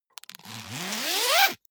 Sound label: zipper pullover jacket open accelerate; objekte; reissverschluss